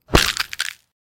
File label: bone breaking impact